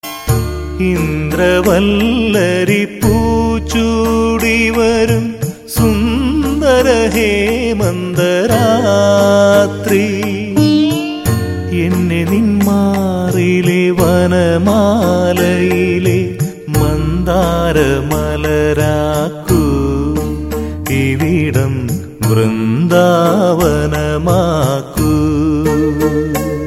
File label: old malayalam